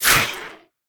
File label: swing; Smite; Tiamat